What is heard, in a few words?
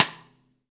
V-Pop CStick, Rimshots; Roland BK-5